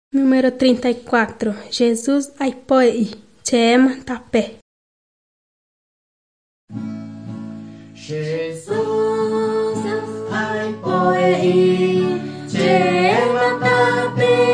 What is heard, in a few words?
songs guarani mbya; bible